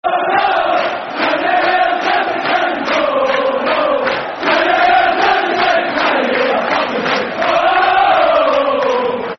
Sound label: Can You Hear Celtic Sing, Football Chants (Soccer Songs); Rangers GRFC